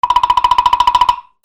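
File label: percussion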